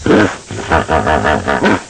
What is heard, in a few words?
hippopotamus, mammals; animals; stamps